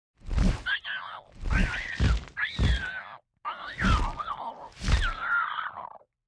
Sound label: birdman idle, monster